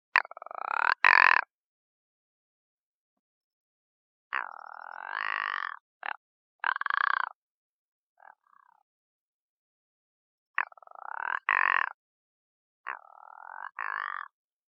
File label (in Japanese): raichou, birds